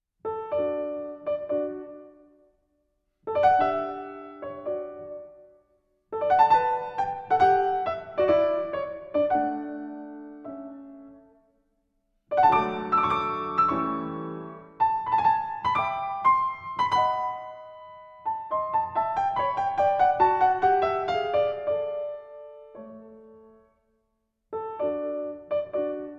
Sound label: sonata in d major andante con espressione; hob xvi 42